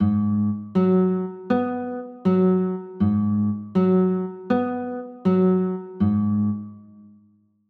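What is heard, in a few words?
G sharp m6 chord, guitar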